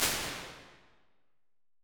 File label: 1.9s Jazz Club, Impulse Responses; Medium Spaces; Indoor Spaces; Apple